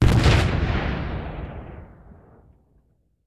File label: aa explosion